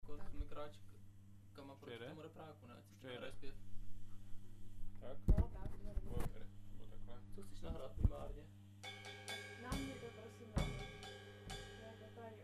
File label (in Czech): zpev kyt